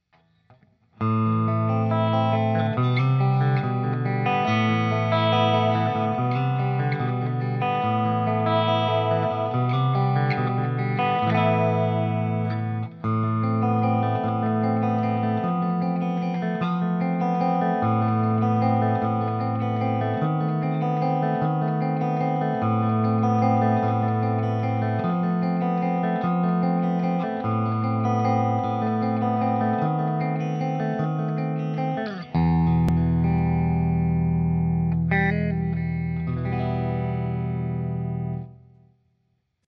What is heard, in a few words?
splawn clean